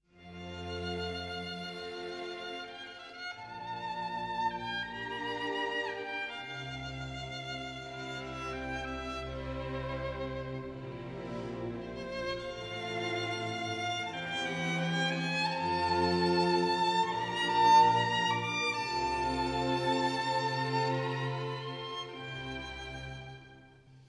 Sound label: Romance for Violin; Beethoven; Violin Concerto; Sondeckis